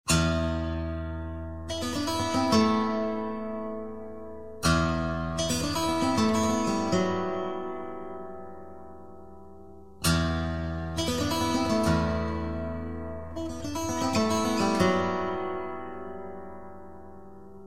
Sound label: acoustic